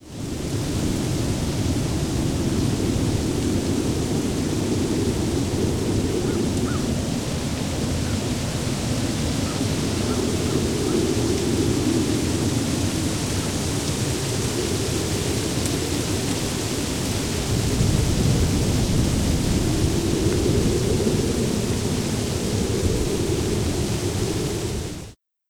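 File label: Yosem Bird wind, Yosemite; Google Earth VR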